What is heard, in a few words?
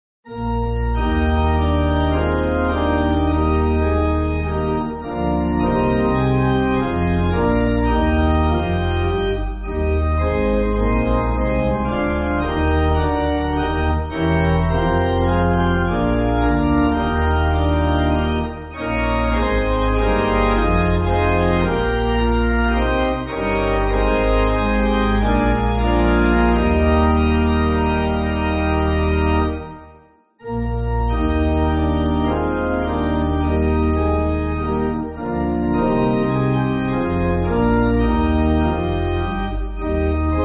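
Pipe Organ, Music Backing Files for Churches; I Know Jesus; Hungarian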